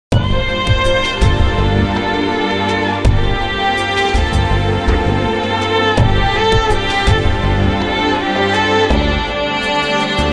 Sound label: erhu asia; ASIAN SOUND TRACK